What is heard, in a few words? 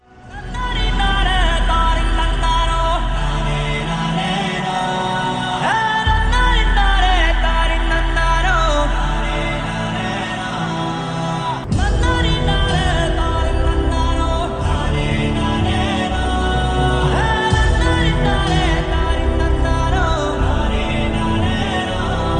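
background music Ringtone, Tamil; ringtones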